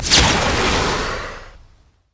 move quick; LoL; super teemo

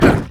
ancient golem effort; LoL; monsters

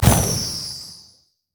sow the wind; LoL; janna

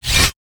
heat seeking missile; LoL; urgot

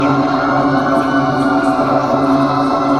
siphoning strike loop; LoL; ammon

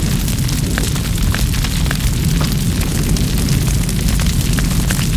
fire; jinx base

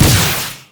heat seeking; LoL; battlecasturgot

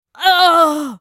Ghost Bride dying; Morgana; Character Voices; English

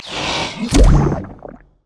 emote joke windup; LoL; zac base

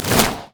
basicattack; LoL; brand zombie

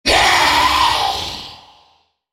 ultimate effort; Cassiopeia; Character Voices - English

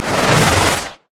surging tides; nami base

